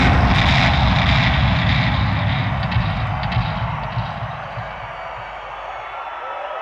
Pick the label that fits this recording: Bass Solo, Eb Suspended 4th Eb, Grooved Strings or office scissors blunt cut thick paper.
Bass Solo